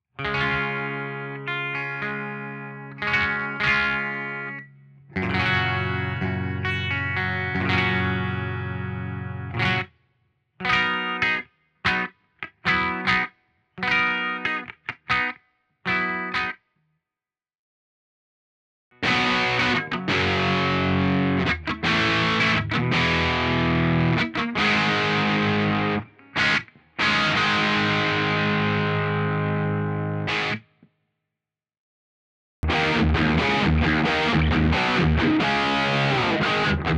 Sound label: D2000 rig HUM; 2000DSL